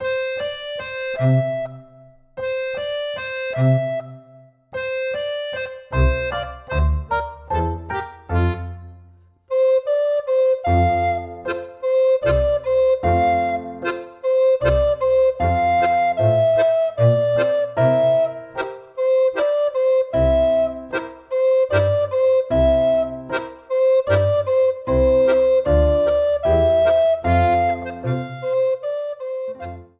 nur vier toene

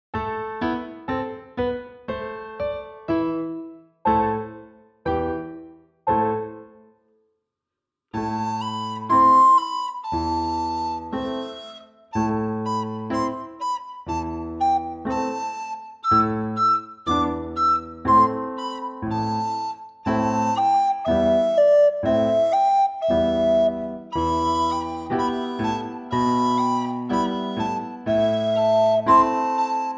legato und staccato

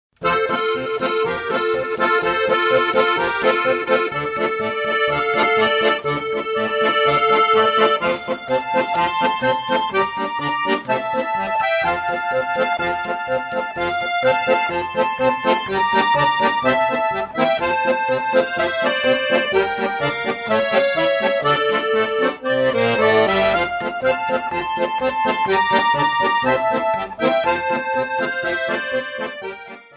csardas die klassische moll kadenz

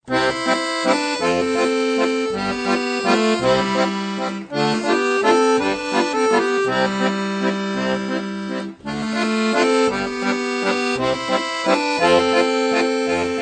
kleiner sexten walzer

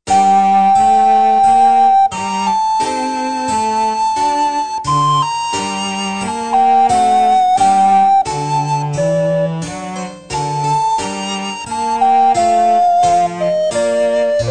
sonate in g moll larghetto

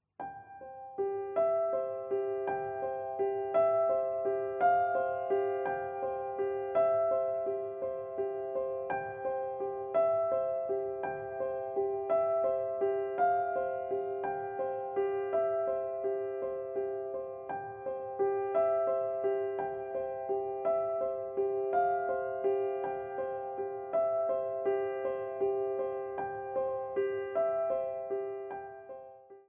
die spieluhr

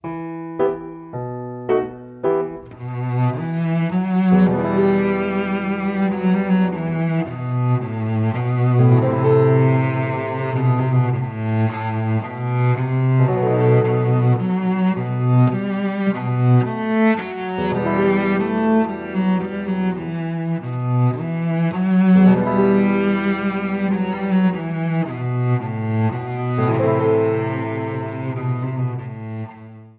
zigeunergeige

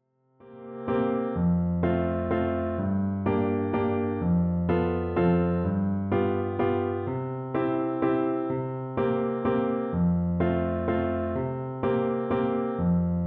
Walzer

